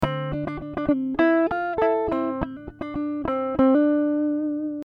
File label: Dm 100 GTR Riffs